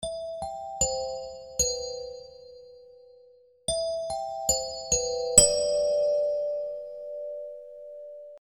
Alto Glockenspiel

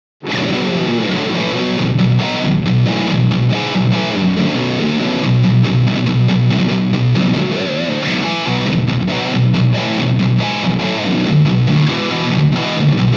Metal Heavy

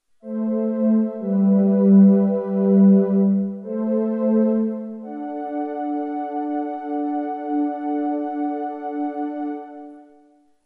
pad

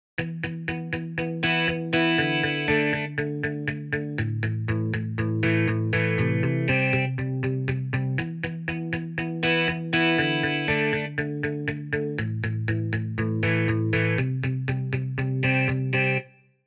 Chorused GLP

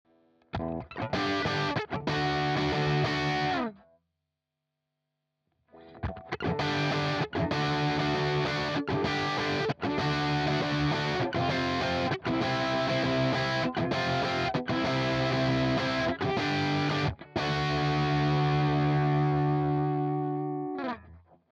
Boost low; UA Lion

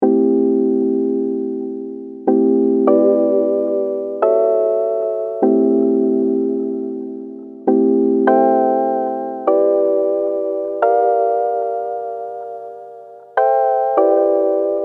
TAL S EMU 2

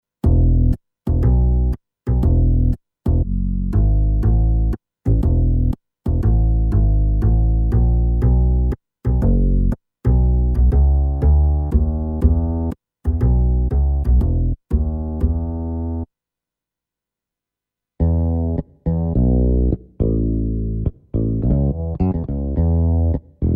Bass

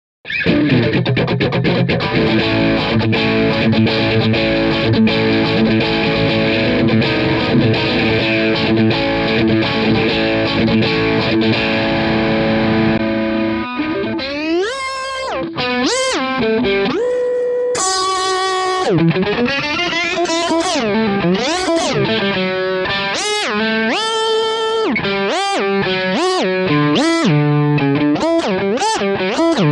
Strat OD Whammy Pedal

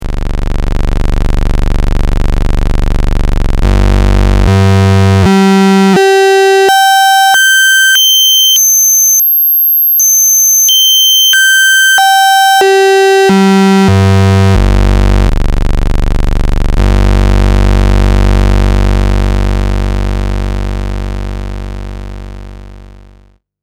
Osc Puls 25

